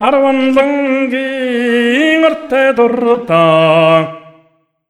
MONGOL, Asian Traditions

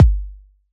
kick machine

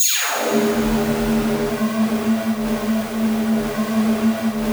DIVER DOWN, Synthy Tonal; Distored Reality

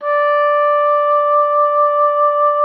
OBOE1, D5; Xsample VOL-6

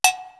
COWBELL 2 SMAL; East West; Global Sounds

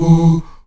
AHOO E 3; Spectrasonics

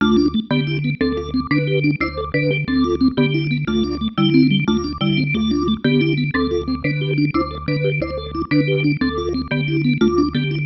Pattern, Organ

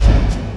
BD, Industrial; BD KIT; Industrial Loops

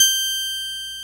ORG VOX, Continental; Roland LCDP09 Keys of the 60s and 70s 1

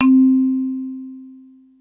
mallets

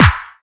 KIT HOU; Best Service; Dance Mega Drums

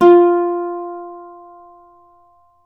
Celtic Harp, Plucked Strings

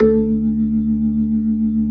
B-3, Jazz

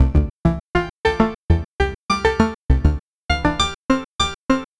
Synth, Trip-Hop Loops; Sonic Foundry (Sony Creative Software) - Rondo Brothers Trip-Hop Loops & Samples; El Corazon

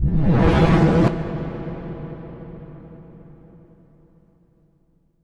Impact Wipe, Cinematic

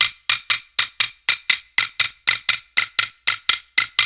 World Dance; OZ!

